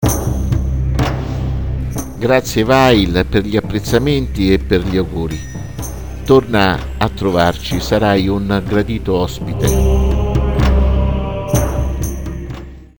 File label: wail